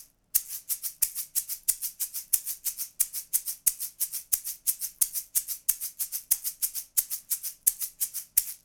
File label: shaker